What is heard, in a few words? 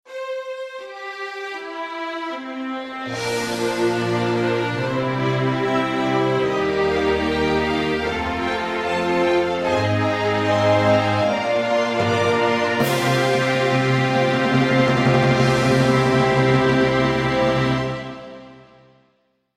Jingle instrumental